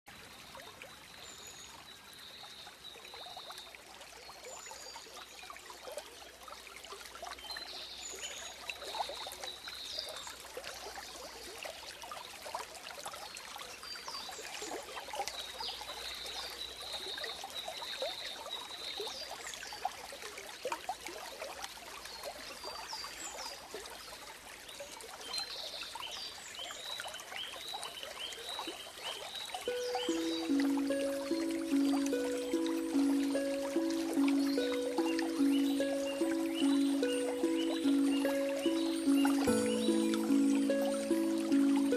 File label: water